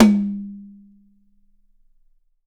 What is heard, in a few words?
YAM 8X8 ROO R, Drums, TUCSON KIT; Big Fish Audio; Ross Garfield Drums VOL-2